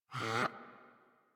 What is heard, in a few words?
Puppy Idle Jump; Enemies; Vocals; Kubrow; Warframe